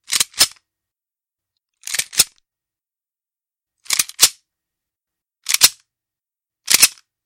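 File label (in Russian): пистолет Browning Hi Power взводится 5 раз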